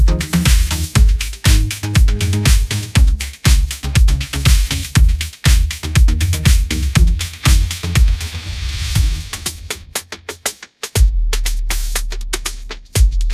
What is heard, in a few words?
catchy pop call alert